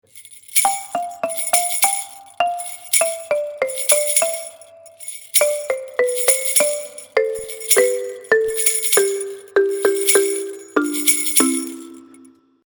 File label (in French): duo xylophone et grelots; loto sonore